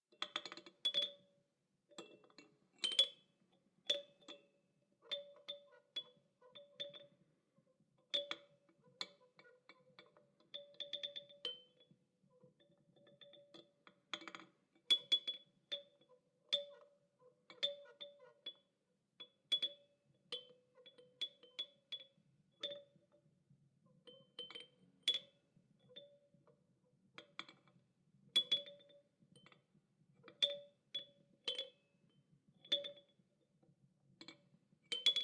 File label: child toy chime clinck and clanks